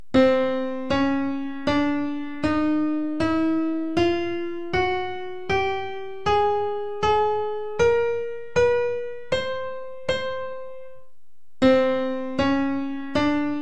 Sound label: madagascar and other african scales